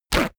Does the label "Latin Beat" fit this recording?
No